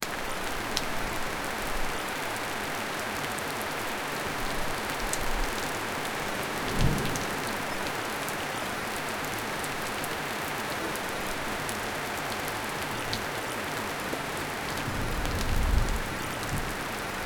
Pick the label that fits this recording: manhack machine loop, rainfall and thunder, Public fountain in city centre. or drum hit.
rainfall and thunder